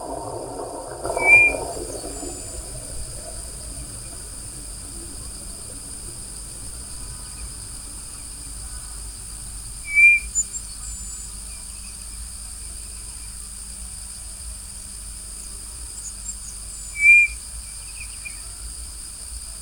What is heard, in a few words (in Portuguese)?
Codorna do Nordeste